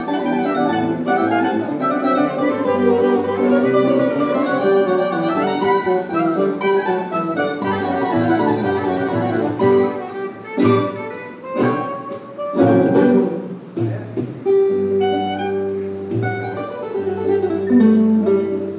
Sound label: band warming up; innovate 2013